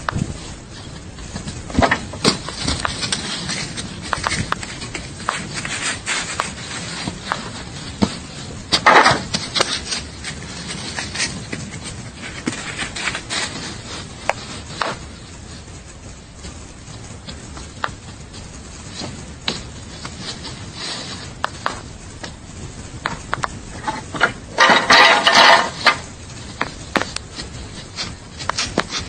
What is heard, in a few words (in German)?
Schlauer Esel